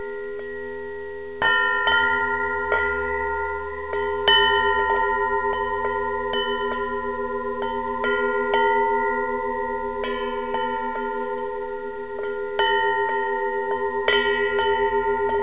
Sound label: Nantucket Bell